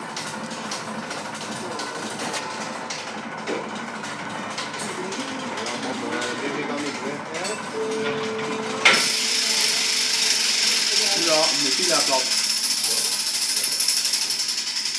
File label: brugge belfry drum room